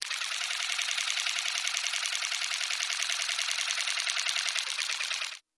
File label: Motor, Small; Electric Toothbrush, Slow Constant, Dips and Stop